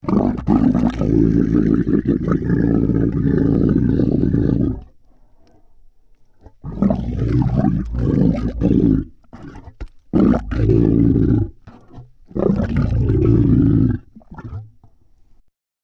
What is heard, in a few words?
Throaty & Deep, Vocals - Monster; Sony Pictures Sound Effects Series